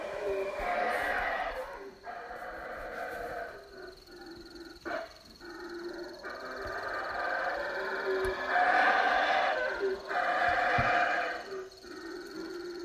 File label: More Jungle